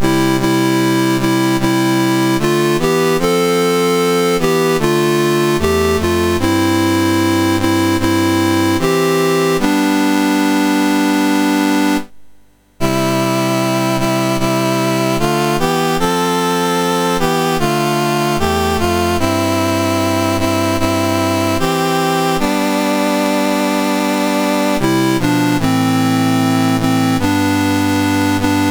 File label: Namibia, National Anthems; MOS6581R3; Dave Marquis